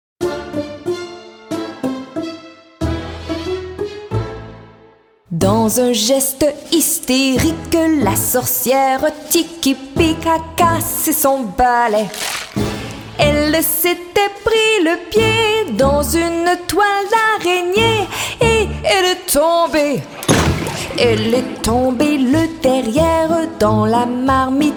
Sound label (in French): halloween chanson pour enfants chanson theme sorciere